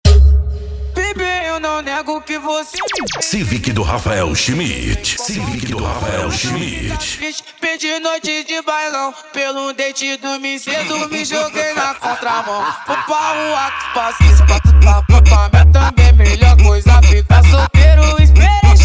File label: funk mega bass